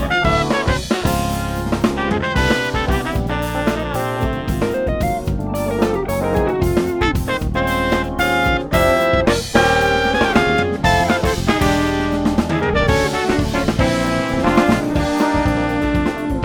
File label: Drum Hits Before D